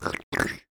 Drooling Slurping Up

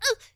Cute Impact

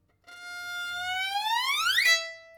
Violin Tenuto Non Vibrato Glissando

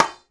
armor

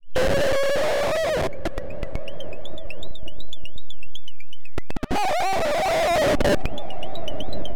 Scream 26 Mph 35 C

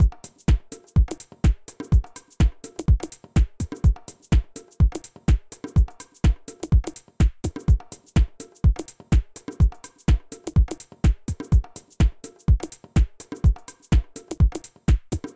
Drums Only

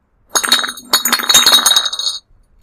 Empty Milk Bottles Being Knocked Over

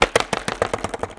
Board Bounce

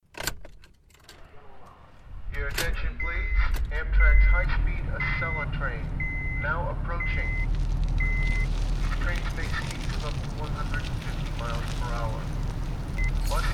Honda Versus Acela Train

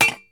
Metal Clang

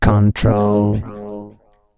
Control Vocoder

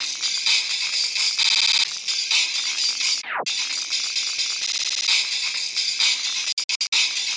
Lofi Static Break Glitch Loop

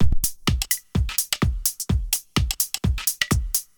House Loop Pitched Down

